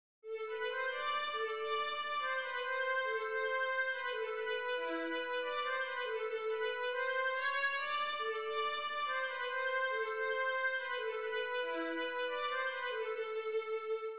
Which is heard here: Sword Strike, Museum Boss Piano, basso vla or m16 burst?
basso vla